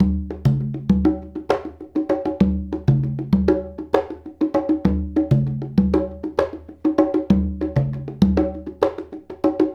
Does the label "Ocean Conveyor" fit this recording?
No